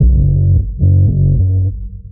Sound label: bass, Loops